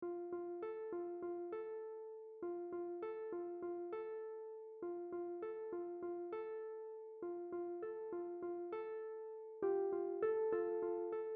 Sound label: Full 100bpm, uke